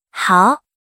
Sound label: tap; linxia